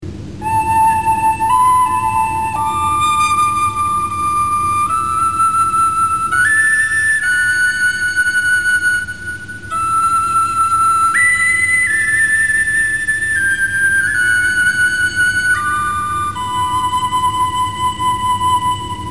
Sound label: Flute & Piano Duet; Sci-Fi; Startrek Next Generation